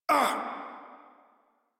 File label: Pain Modular Corpus Male; Dialog; Barks; Battle; Warframe